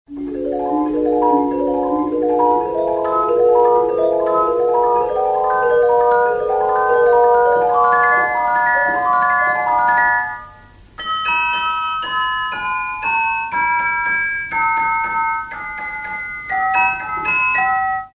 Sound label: celesta